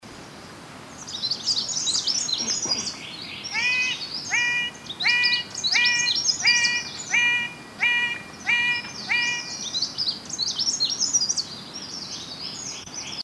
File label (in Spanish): sonido ave paraiso azul paradisaea rudolphi